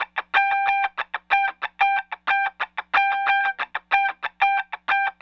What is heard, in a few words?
Call And Response Guitar, 70s Analog Funk; Sonic Foundry (Sony Creative Software); What it Is!